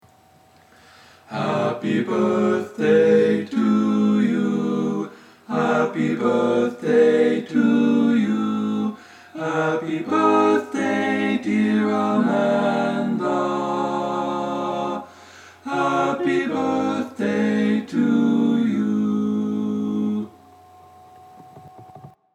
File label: happy birthday, barbershop